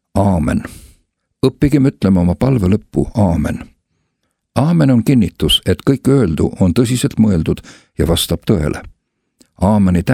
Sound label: Amen; Meie Isa palve